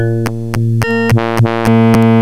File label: ENSONIQ DX SOUNDS